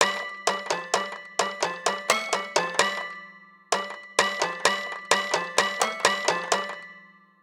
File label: Prepared Toy Piano Melody G Minor 129 bpm; MIDI Clips; Tonal; Ableton; Factory Packs; Lost and Found